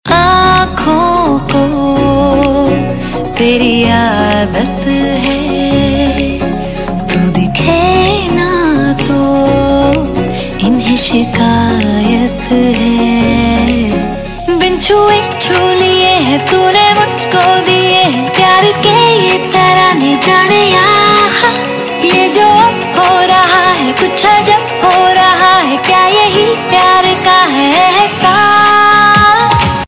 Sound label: female ringtone; Bollywood